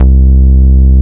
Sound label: HOUS C3, HOUSE BS; Drums In Your FACE!